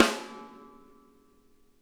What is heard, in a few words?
SNARE; Sonic Reality; Interactive Drum Kits